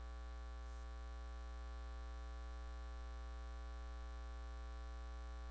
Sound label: impact